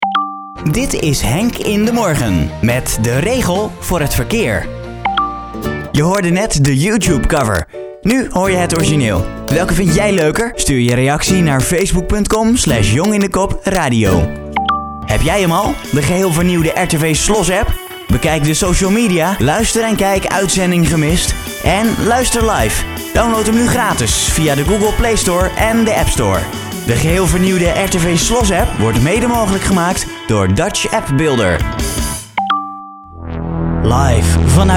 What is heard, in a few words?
Jingles